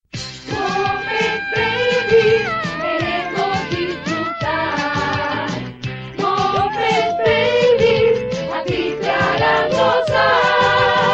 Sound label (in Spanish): Intro Esp; Muppets Babies